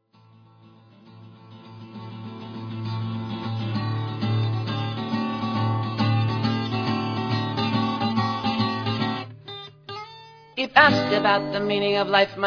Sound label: Modern Irish Drinking Song